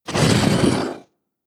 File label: MUDRAPTOR idle